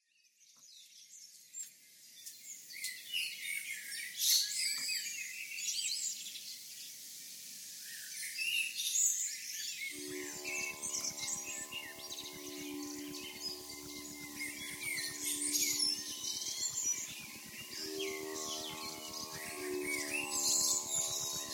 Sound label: Birds And More